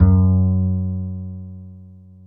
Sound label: PX ACOUS.F#2, Bass; Masterbits CLIMAX 7; PX BASSES